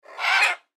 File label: Bird Raven Screech; Animal